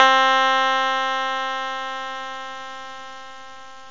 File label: Clavi; GMPlay